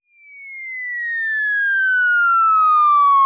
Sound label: Falling